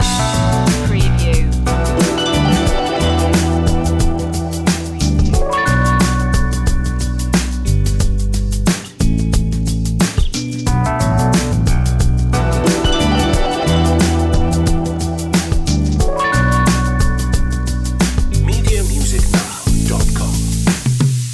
slow funk reggae loop

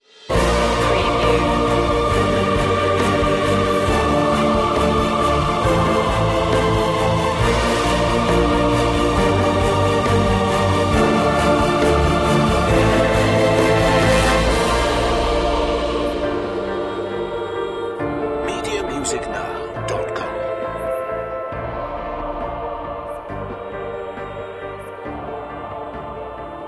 the fountain of life with choir

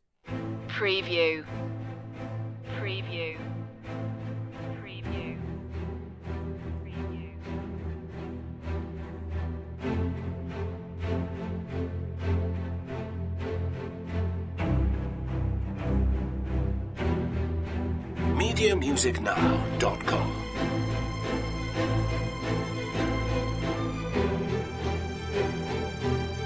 strings mix

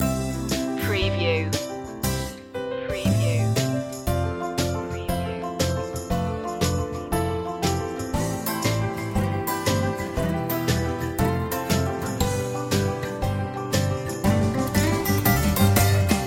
closer loop

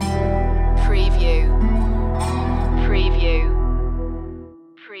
twangland

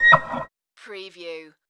creature hurt

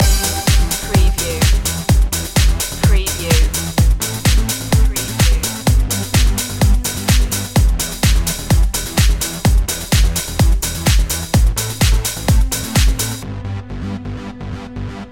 spin me around loop

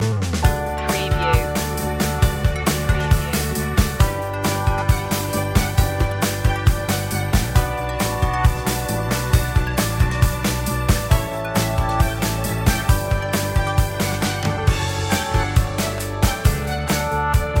positive energy; self confident

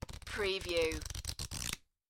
Cards Playing Cards Flipping Through

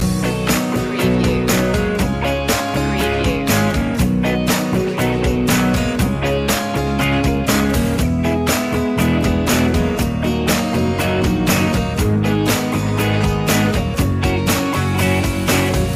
joyful ride loop

